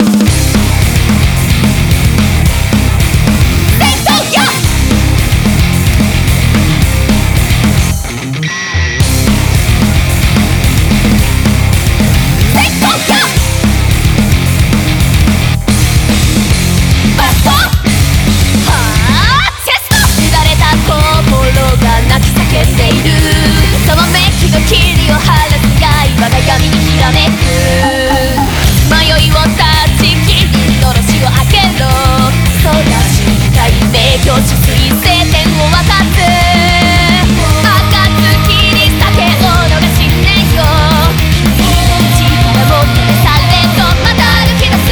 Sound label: BGM Reach